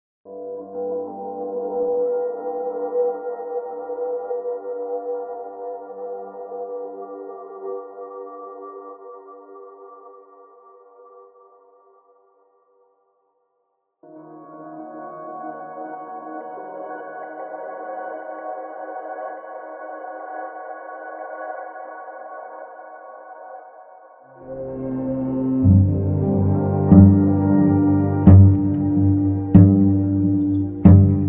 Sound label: Heartbeat cello; TELONIO; Gratomic